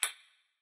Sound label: Bullet Bounce